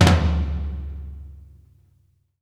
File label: FLOOR FLAM, TOMS; Sampleheads; New York City Drumworks VOL-1; SP TOMS